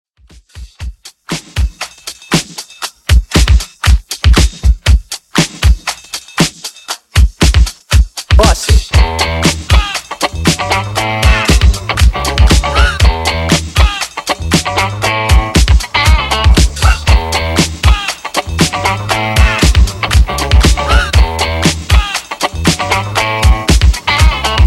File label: Re drum